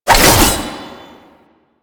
Passive Sickle Spin; Warframe; Dagath

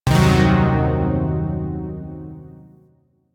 Shawzin Blues Chord D; Music; Warframe; Zariman Shawzin

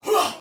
Pain, Soldier Female, Battle; Sinecure

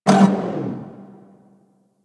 Ship Turret Stop A; Player Path Beam; Fomorian

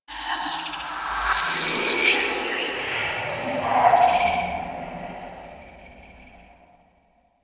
Derelict Corrupted Artifact Random; Ambience; Props; Warframe; Orokin Derelict

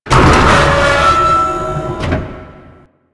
Piston Machine A; Ambience; Warframe; Grineer

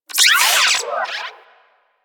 Digital Glitch; Ambience; Warframe; Corpus Railjack

